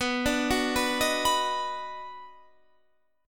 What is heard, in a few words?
D sharp Minor Major 7th Sharp 5th B, chord